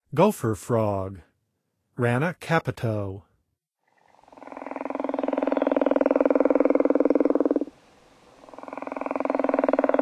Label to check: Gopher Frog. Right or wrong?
Right